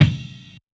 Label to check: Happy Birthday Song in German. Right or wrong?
Wrong